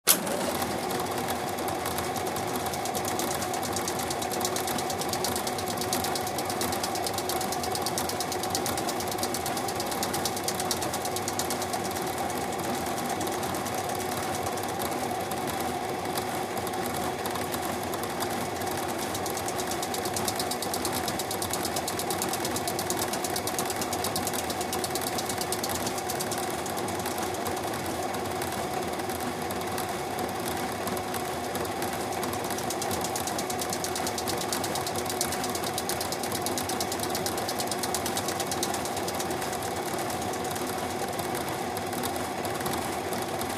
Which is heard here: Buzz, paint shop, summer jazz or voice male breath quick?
paint shop